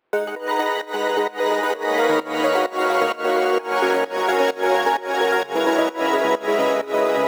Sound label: Arp